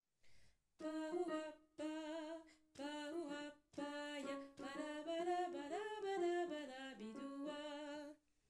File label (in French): canon scats